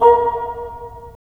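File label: wind bassoon; LA Composer vol. 3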